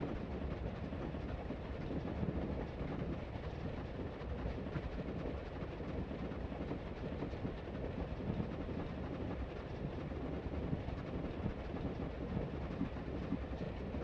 Steam Railroad

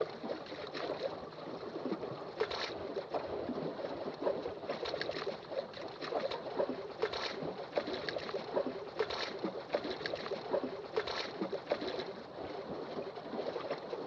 Lapping Waves; SynTex

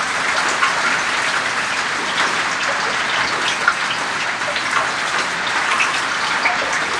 Heavy rain falling and dripping; Wei-Hsiang Liao

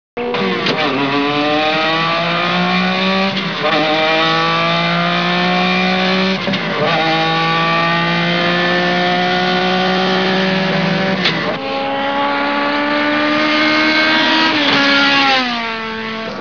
racing

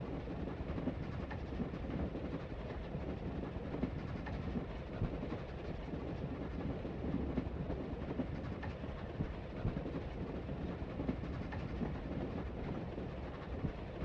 Steam Railroad

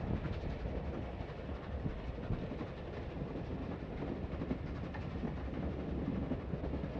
Steam Railroad

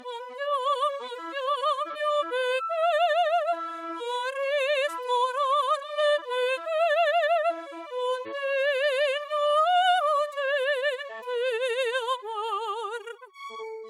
opera fem; Wei-Hsiang Liao

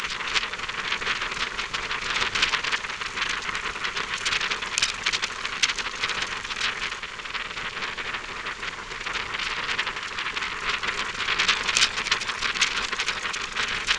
shaking paper; Wei-Hsiang Liao

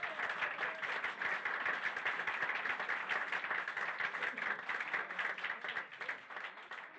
Enthusiastic Applause

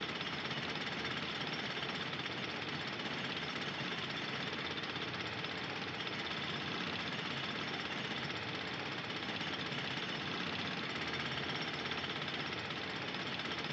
Pneumatic Drills; SynTex

